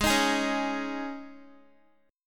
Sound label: Ab Suspended 4th, Guitar; strummed; Absus4; x,11,13,13,13,x